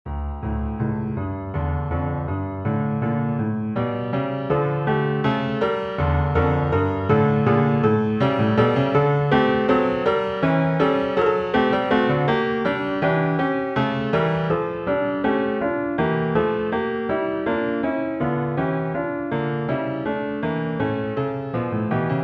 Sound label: Waltz in D minor